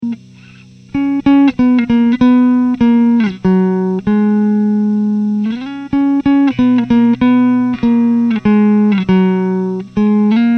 amp Test

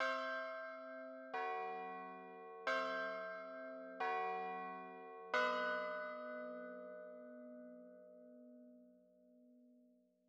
Bells

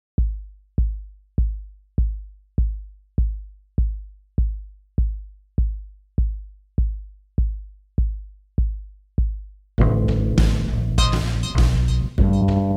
Whale Groove; Friendly Weekend

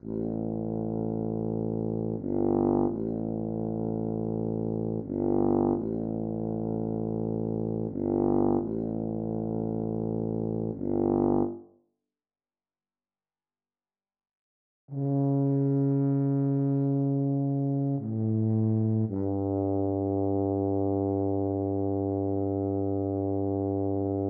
Tuba

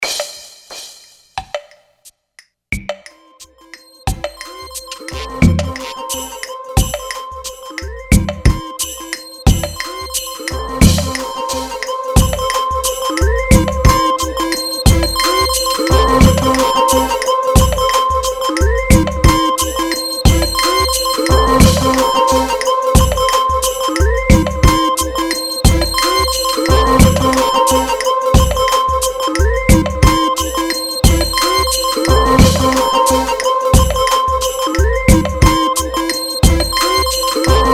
Funky Intermission

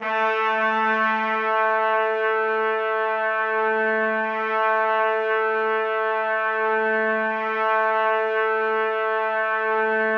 Trumpets

